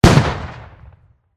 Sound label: Simple Explosion